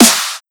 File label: Snare